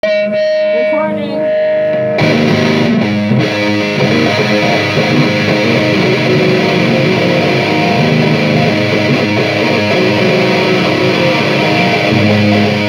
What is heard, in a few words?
car crash riff